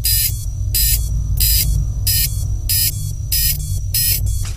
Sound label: predator bomb